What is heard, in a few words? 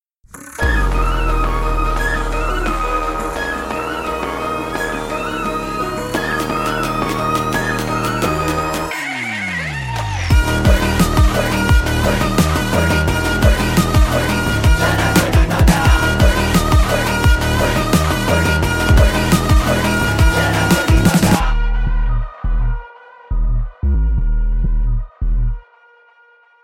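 background music ringtone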